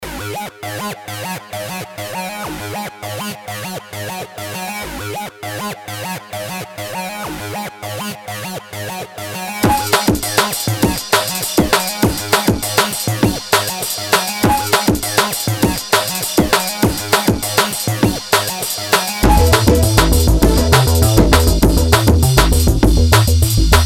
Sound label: electronica synth